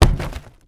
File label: Cardboard Box Collision; Angry Birds Rio